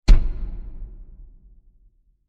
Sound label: Small Impact With Large Reverb; Miscellaneous Impact; CraftedSignals